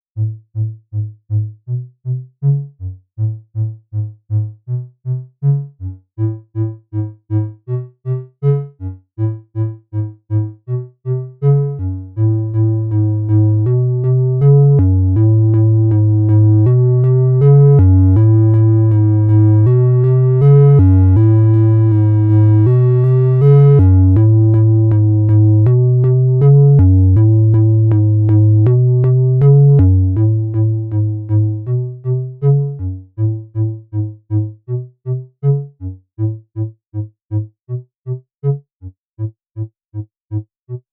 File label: Triangle Bass Slow VCA; Moog DFAM